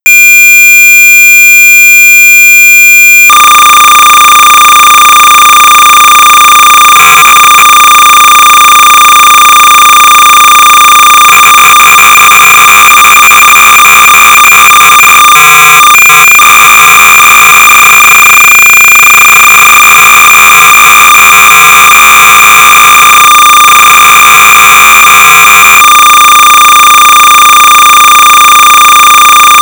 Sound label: Sound Effects Generator; 300baud; Req ZYTEK Analogue Interface and Joysticks